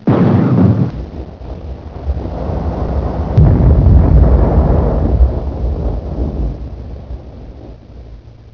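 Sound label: CANNON, GUNS BOMBS; EFFECTS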